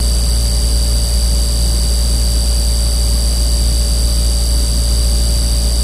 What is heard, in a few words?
Fluorescent Light B, buzz & hums